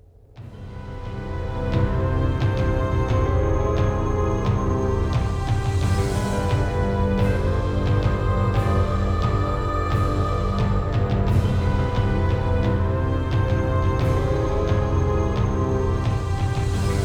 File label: Forest Search; bright memory mobile